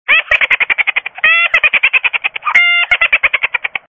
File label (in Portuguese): Fantasma Rindo Liga, sons de risadas de fantasma